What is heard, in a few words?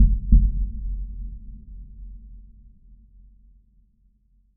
Synthetic Hart